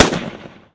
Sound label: Shot; This Is the Police